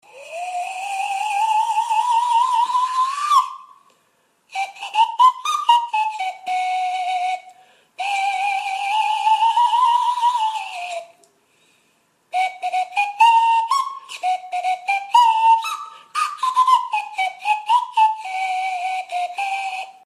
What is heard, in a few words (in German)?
Holz Lotusflote